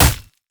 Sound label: basic attack; olaf